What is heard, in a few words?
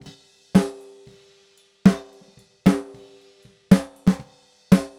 snare